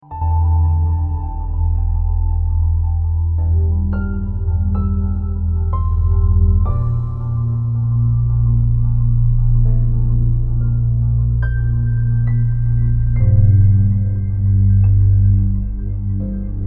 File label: Slow And Beautiful Sound